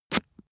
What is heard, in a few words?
spit; soundpool